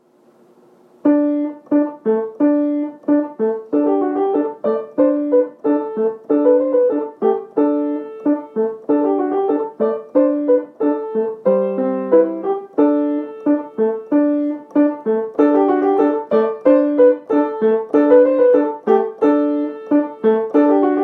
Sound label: Medieval Procession Primo